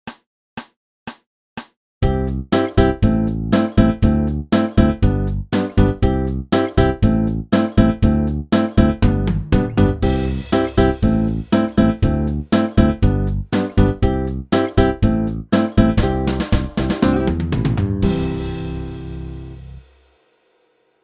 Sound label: Test tune